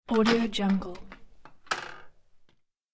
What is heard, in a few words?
standing bike in garage